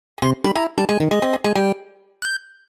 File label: knockout; kirbys dream land